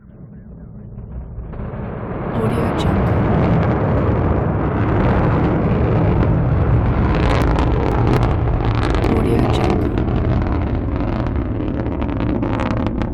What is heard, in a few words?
intercontinental ballistic missile icbm being launched